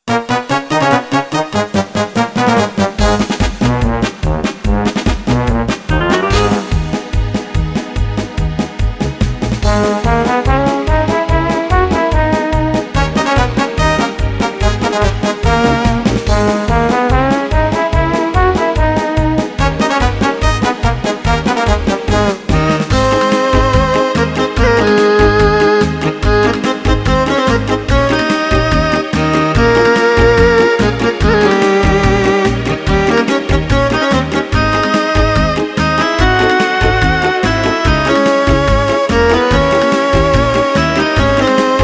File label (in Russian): klassicheskaya muzyka i retro ringtony na telefon